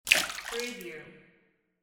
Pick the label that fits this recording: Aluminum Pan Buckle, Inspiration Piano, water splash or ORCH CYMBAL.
water splash